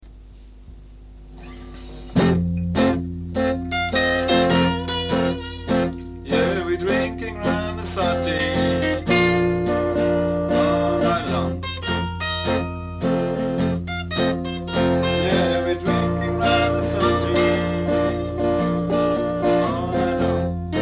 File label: ramazotti blues